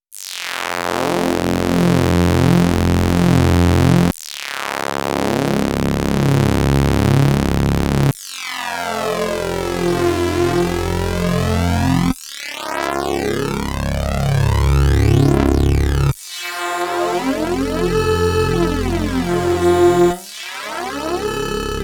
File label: Analog Sweep; Dipole